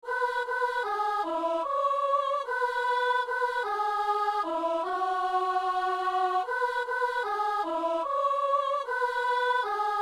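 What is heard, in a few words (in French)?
entends nos prières, soprano; Messe du 17 janvier à Saint-Denis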